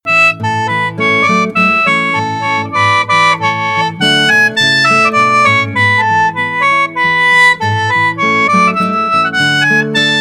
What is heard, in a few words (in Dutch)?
Sheng D 17 pijps; Paspie Menuet; Staalkaart